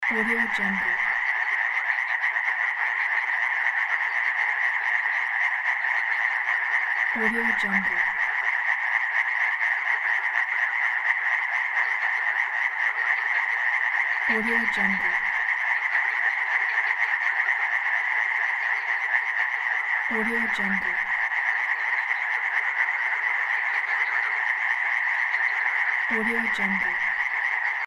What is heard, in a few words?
many frogs loop